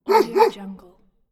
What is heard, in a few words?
the dog bark